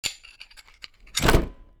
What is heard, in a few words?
Pry Door; Scrap Weapons; Zomboid